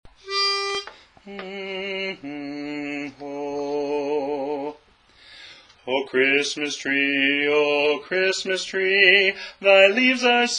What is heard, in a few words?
Bass; O Christmas Tree